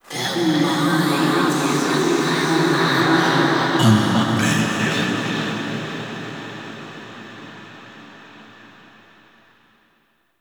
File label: Cave Echoes; The War Within; Golden Maw; Queen